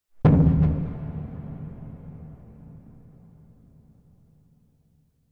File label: Impact Abstract Timbale Drum Hit - Double; Hollywood Edge; sound designer's toolkit v2